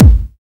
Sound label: clean steel kick drum sound A key